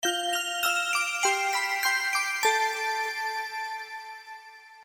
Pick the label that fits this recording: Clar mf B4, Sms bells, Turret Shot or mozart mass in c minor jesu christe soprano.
Sms bells